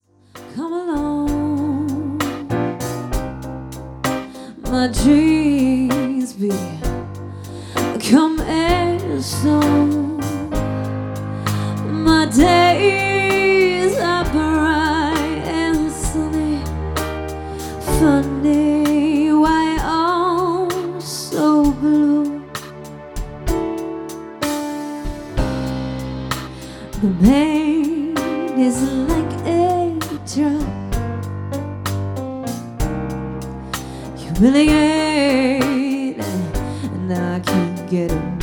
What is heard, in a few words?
baddest blues